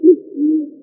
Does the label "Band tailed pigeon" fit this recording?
Yes